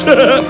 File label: laugh; doom